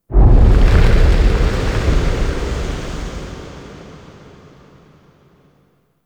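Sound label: Distant Explosion Debris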